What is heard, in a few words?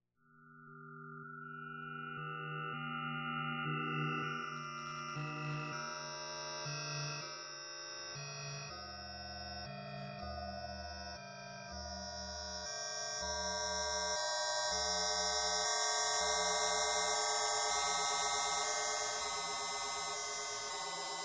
frog ranch